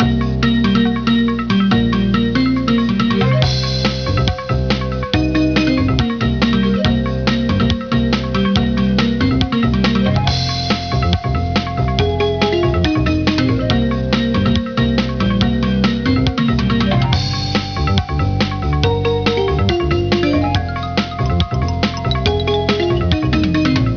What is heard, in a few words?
Prehistoric Plaza; PowerPete Demo; Music